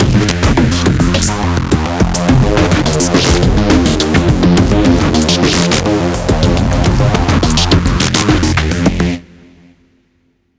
Fight Low Combo; Remember Me

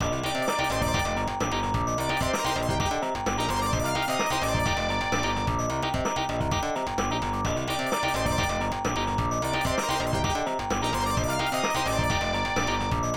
Allegro; CP System; arcade

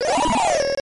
Coin; Ordyne; Namco System 2; arcade

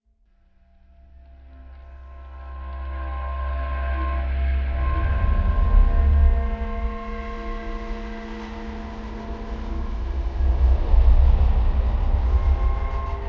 Crash Tension; Outlast 2; PS4 Windows Xbox One

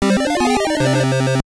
Jingle, pinball

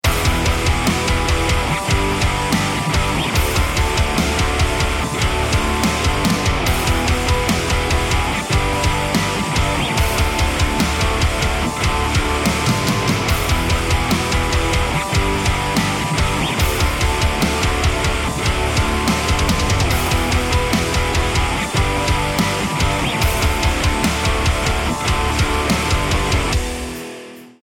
choose team

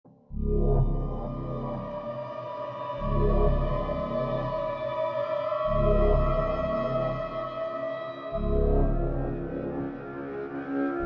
AMBIENT; F.E.A.R. Perseus Mandate

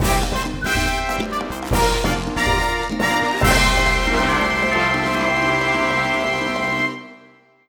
Big Fanfare!; Kirby and the Forgotten Land

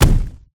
chase footsteps; Mommy

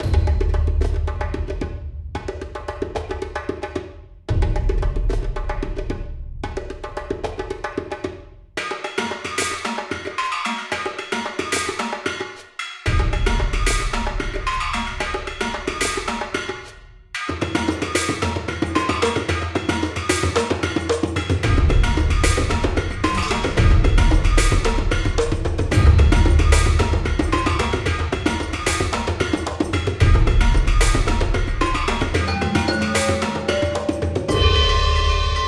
Tribal Combat Short Loop

